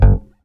bass 3 (G); Sonic Foundry (Sony Creative Software); Ugly Remnants VOL-2; Basstuff

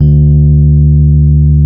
D 1, Electric Pianos; Wizoo

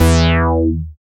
BAS MKS-50, Roland

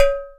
EQ Perc, Percussion Mix; Equipped Music; Loungin House

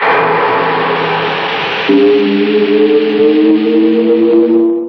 Ancient Noise FX, Industrial

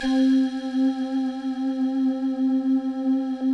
soft voices, Soft vox; Optical Media International; Sonic Images Library

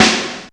drum g#3, DRUMCOMPUTER EMU SP 12

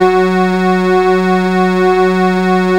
SIEL, String Machines

